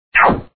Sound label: slide